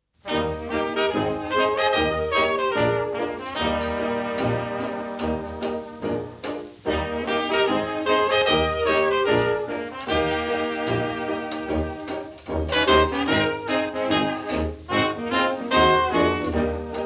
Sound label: Blues